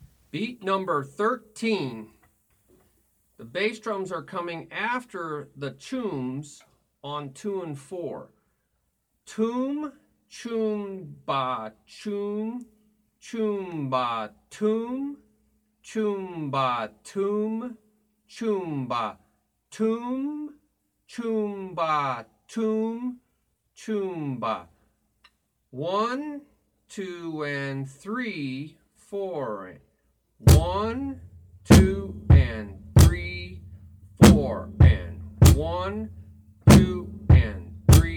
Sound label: Beat 1 2 3 4 Toom Choom Ba Toom Choom Ba